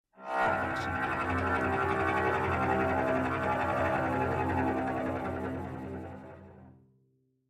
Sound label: Cello Sul Pont Tremolo; SoundsCrate